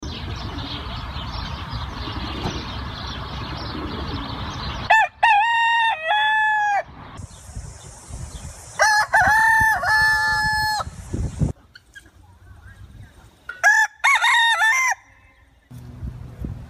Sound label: Rooster Sound